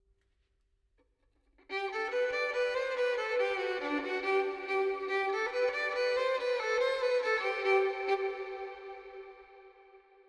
violin FX 4-4 GM 70; Melody; Sample Banks

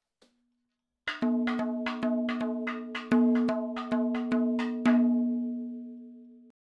110 7 8 MADALAM FILLS, percussion; Sample Banks; MADHALAM; MADALAM 7 8 FILES

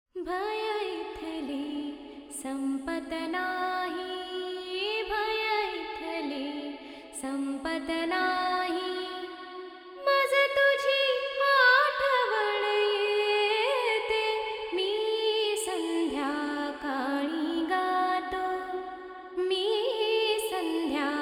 FX 4-4 D 100, Vocals Female; Sample Banks

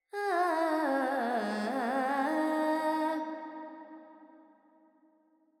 Verb Charukesi, Carnatic Female Alaps

